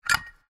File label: clown knock; Joker Slot; slot game